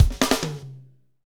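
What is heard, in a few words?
beats funk, Roland; Northstar - Drumscapes Roland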